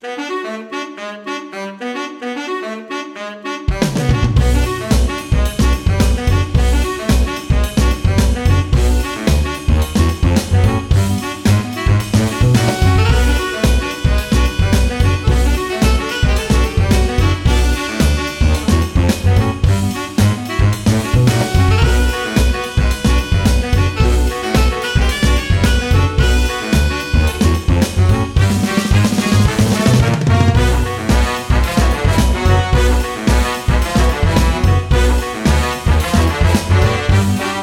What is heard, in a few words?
Salsa Tango; Game Music